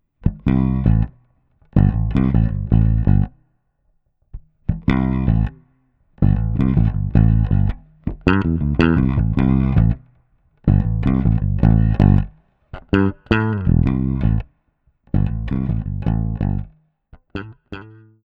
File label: Passive Split Coils Bass Track; king koa